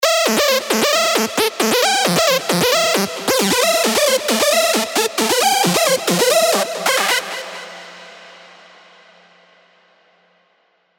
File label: Rave Grenade, Spire; alonso 18 year anniversary pack